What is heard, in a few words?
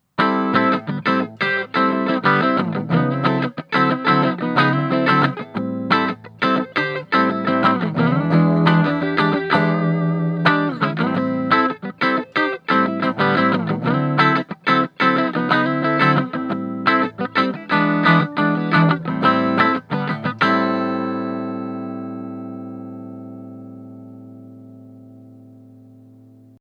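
funky middle, Clean Funk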